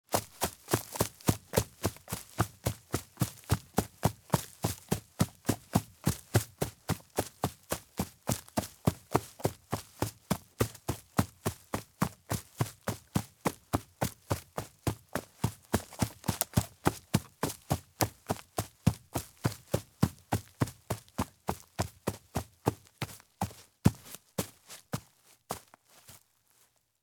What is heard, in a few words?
Running Shoes Run in Forest; Sound Ideas; Footstep Sound Effects